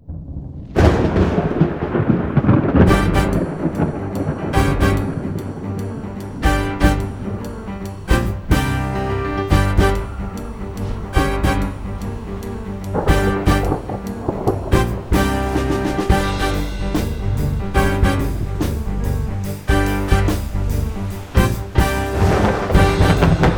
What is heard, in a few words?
storm